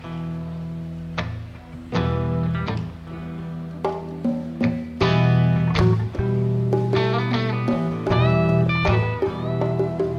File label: jazz